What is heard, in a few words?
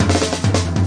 137 2beat filler, drum loops and breaks